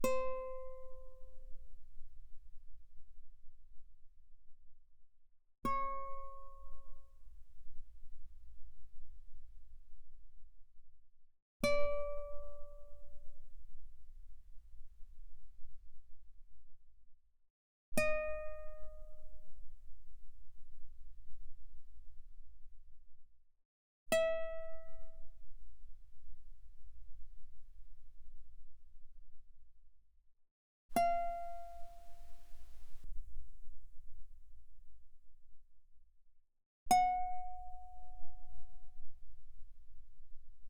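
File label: Guitar mezzo forte sul E C5 B5; mono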